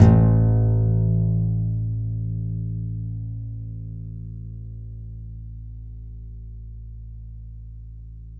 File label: C. Bass Balalaika, Plucked Strings